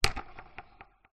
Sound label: drone dji phantom 3 prop spin push finger; transport